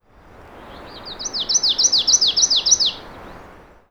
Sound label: common yellowthroat atypical; short